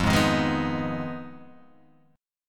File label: F Minor 7th Flat 5th F down Guitar Half Step Down